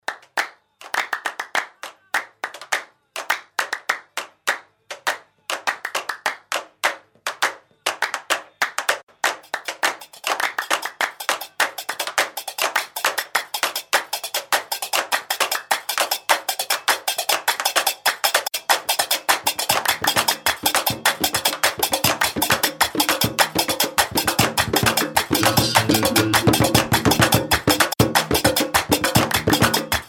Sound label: Flamenco Beats